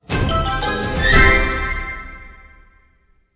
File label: win Medium; jingle spin